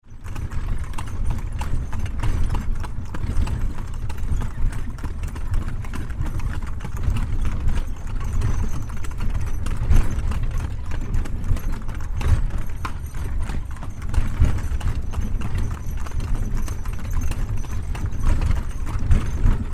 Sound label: horse cart, Burma